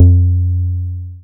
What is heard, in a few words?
BAS SH-101; Best Service Gigapack 2